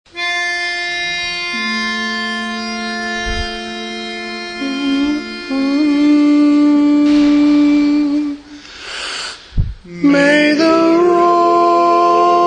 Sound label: Irish Blessing Tenor